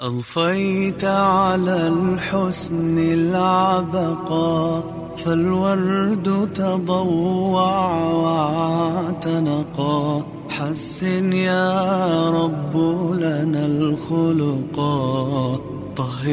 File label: islamic tone ringtone; islamic ringtones